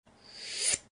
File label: Foley Sucked Air Out